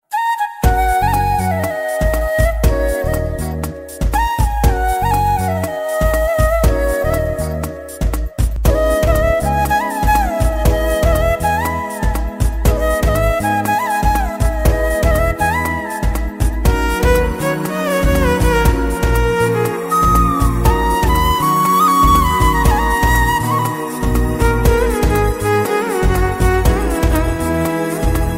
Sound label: flute ringtone